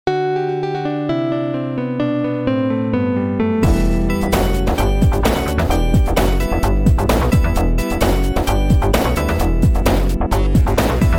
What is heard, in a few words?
Retro; Jojo